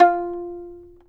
fiddle pizz F4 L; Sampleheads; Whole Lotta Country 1 & 2

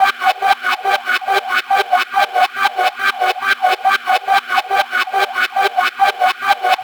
140BPM B; Vengeance - Essential Clubsounds Vol.4

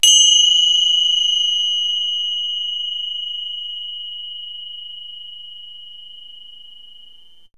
FING CYMBAL, FINGA CYMBALS; Zero-G Ambient 1

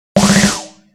Fill, Snare Fills

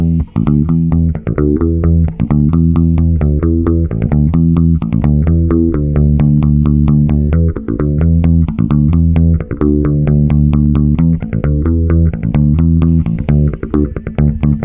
FEVER 131, Bass Grooves; USB Soundscan vol.30; AKAI

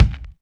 Alesis DM5 Kick

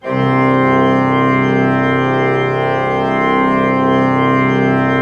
Orgue Eglise Full C3, Pipe Organ Full

